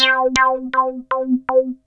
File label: SAW FILTER